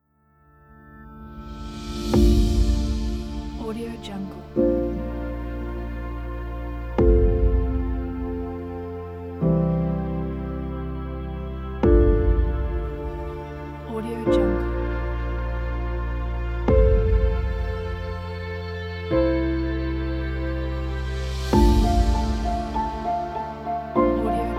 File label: documentary piano